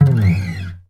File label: MARTIN AC, Bass; Sampleheads; Will Lee Bass Library; MARTIN AC 4